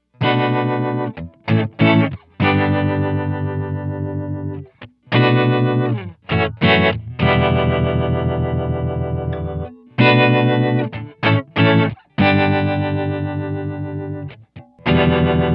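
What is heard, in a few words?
harm, Strymon Flint, Guitars; Mellow Harmonic.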